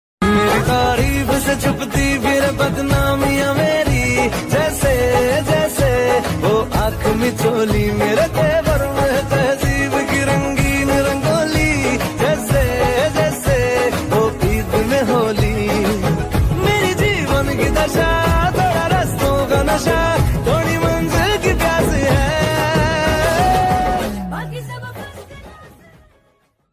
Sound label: ringtone music